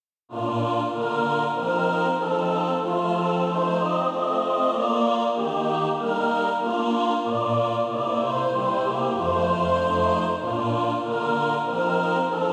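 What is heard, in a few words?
mixed, Practise Music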